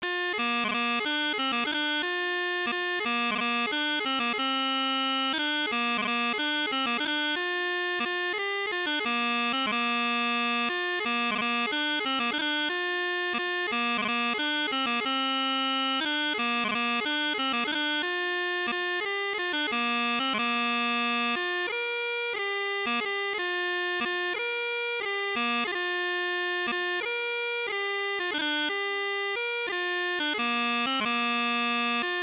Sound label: Pipe Band March; 2/4; Street Music